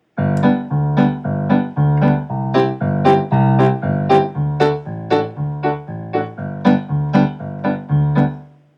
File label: G C D G root fifth bass chords, simple piano recordings